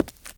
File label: Stone; kult